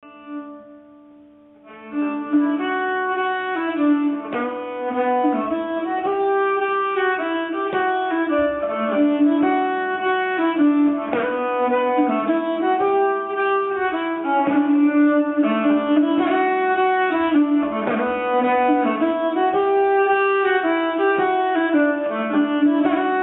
Mignonne Mazurka

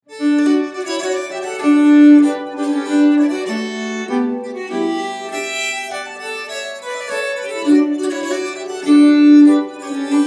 polska, nyckelharpa

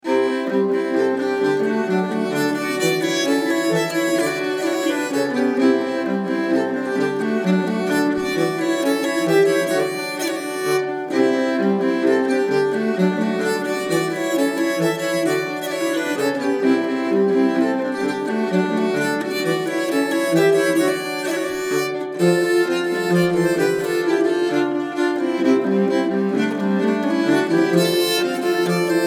Bangpolska, nyckelharpa

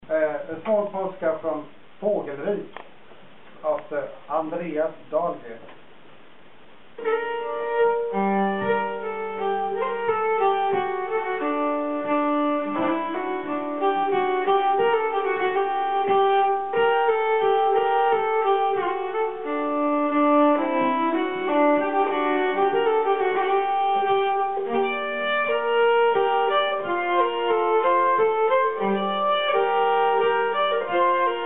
tune, nyckelharpa

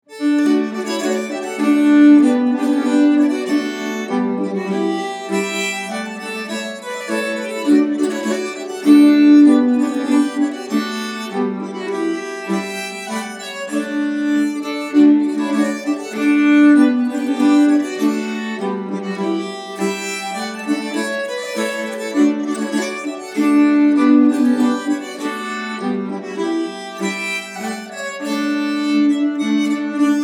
polska, nyckelharpa

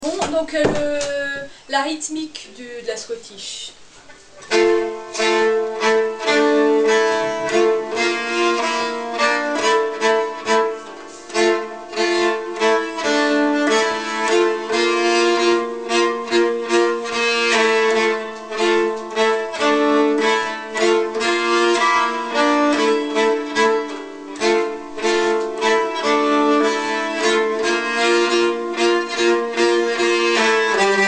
scott acc, nyckelharpa

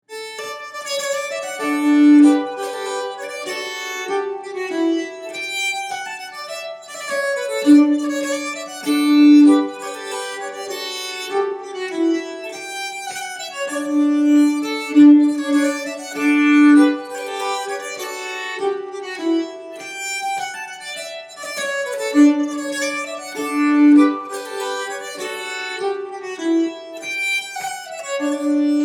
polska, nyckelharpa

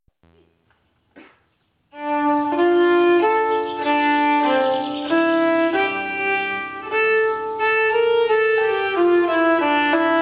shottis, nyckelharpa

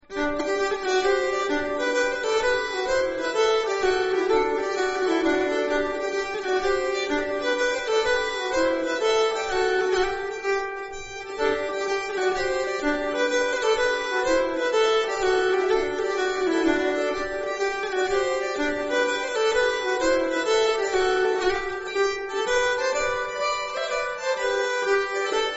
mazurka, nyckelharpa

